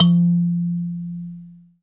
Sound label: KALIMBA; Spectrasonics; Heart of Africa; KALIMBAS